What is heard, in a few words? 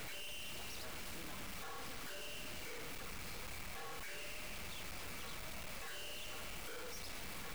loop, Class 47; OpenBVE; Train